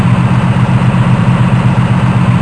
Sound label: Motor, Train; M41 Halbi Light